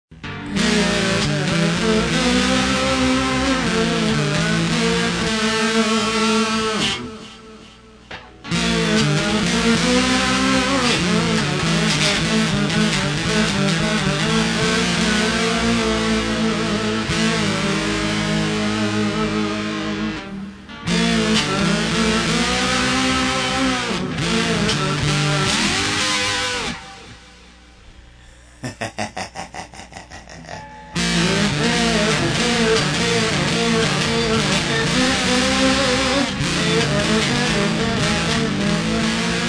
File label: Lap Steel; D.F. Duck